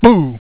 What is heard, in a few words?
scaird boo